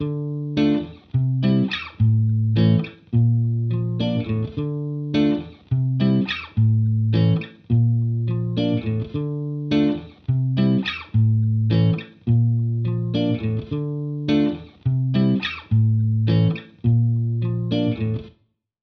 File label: Main, 105BPM D; 99Sounds; Monosounds; Music Loops; PANDORA BOX!; Jimmy's Style